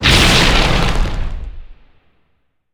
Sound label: Fire